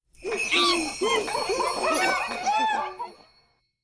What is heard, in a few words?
sfx sleigh; holiday whodunnit; krusty sleigh